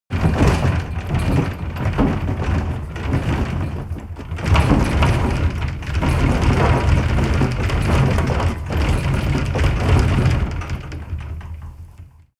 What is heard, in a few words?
interior rattle hard